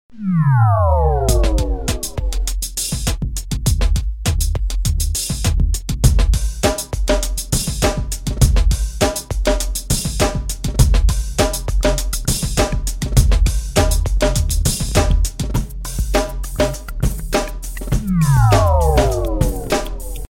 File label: hip hop underground